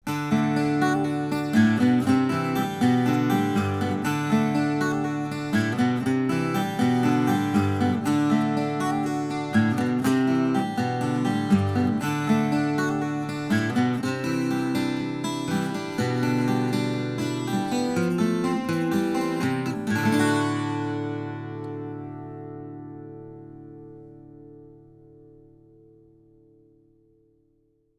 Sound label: 12 finger raw, guitar